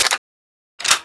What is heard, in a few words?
hmg open; weapons